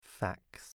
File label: fax